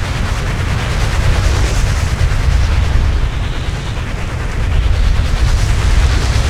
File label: tornado mini; smite; kukulkan